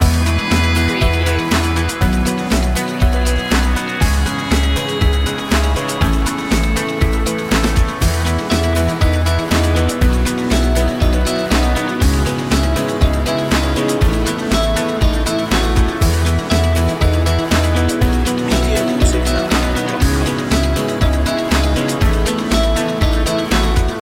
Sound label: uplifting and motivational loop